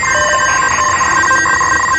uplink

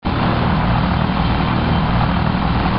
transport flight

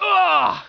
scream

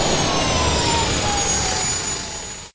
VTOL land

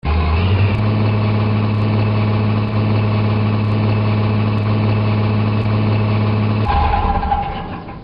truck

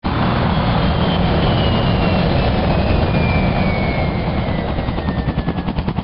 transport land

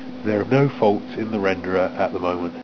scream

